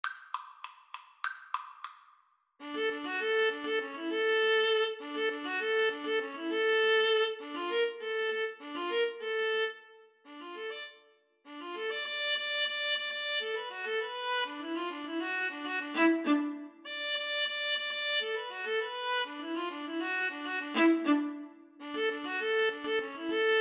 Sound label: rag viola duet